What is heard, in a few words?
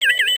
message